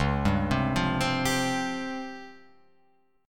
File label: Db Major 7th Flat 5th Db down Guitar Open F; arpeggiated